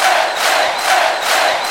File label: Crowds; Vengeance Samples; VEC2 FX